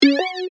Chime Notification, Multimedia, Chime, Notification, Bell, Beep; Chimes; BlastWave